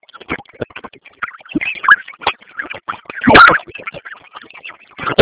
jump; freak fortress 2; mac

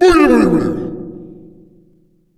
halloween boo, ghost

